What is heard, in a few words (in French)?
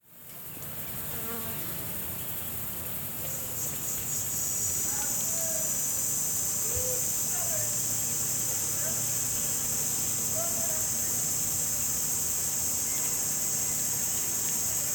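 Insecte, Paysage sonore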